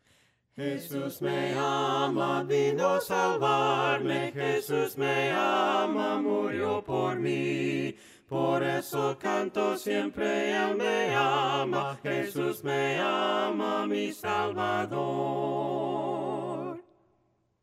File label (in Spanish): VOCES; JESUS ME AMA